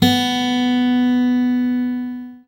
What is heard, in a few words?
GUI AC. B, Ac. Guitar; Sound & Vision; Gigapack I CD 2; GUI ACOUSTIC 1